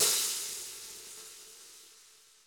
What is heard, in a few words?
cymbal, Roland MC-909